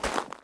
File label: zombie footstep; DeadFright